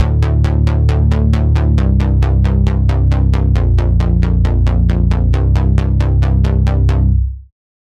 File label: Bass, Serum 2; Routed Sound; Serum 2 Expansion - Reality Maker Vol.1